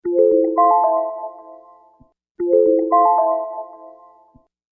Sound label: Notify; meloboom